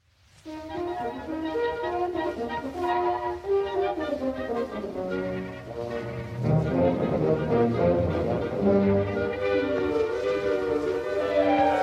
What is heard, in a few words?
Concert Band; Debussy-Ravel